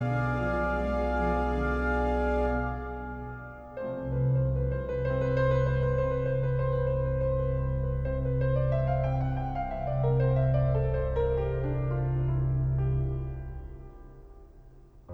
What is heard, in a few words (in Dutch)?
Slavenkoor nabucco ( instrumentaal )